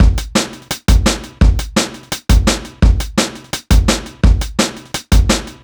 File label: E Beat, Electronic Beats